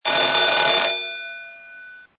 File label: Bell Intermittent Gas 1 Sec ON 1 Sec OFF; IMO; PAGASYS